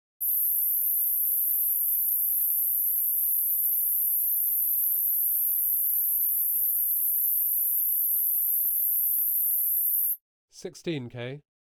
12.5kHz; Alan Parsons & Stephen Court - Sound Check 1993

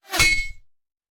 Bullet Impact; Sound Ideas; Guns

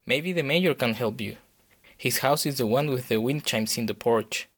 guitarist; DeadFright

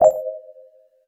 ui menu button beep; Space Wave Race

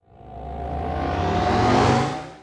blast charge long; Apotheorasis

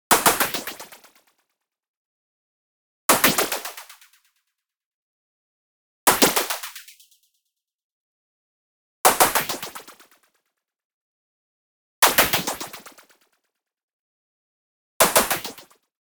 IMPACT WHITE NOISE Small Bouncer; boom library; Anime Essentials

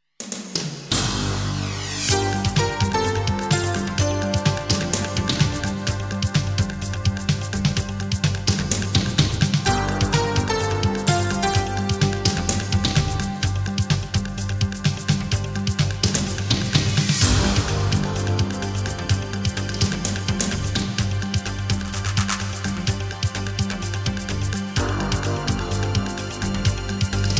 Jingles; 90 DDD Jingles

